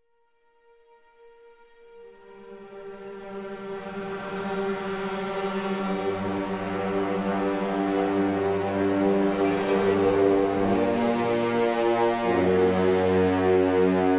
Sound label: reveal mood; reaper; inFamous; Play Station 3